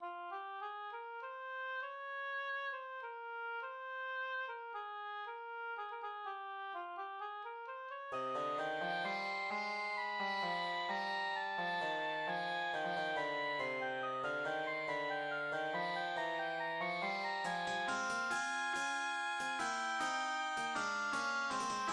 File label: theme oboe